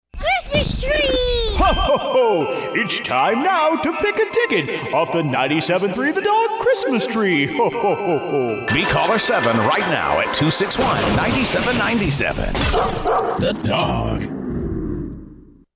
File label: sounder